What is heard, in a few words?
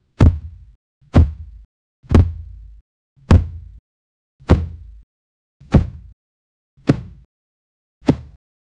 heavy limp thud of a, body fall